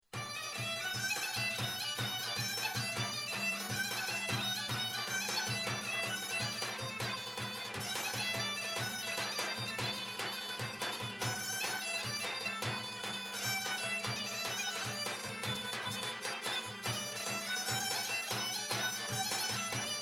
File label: elenino horo fast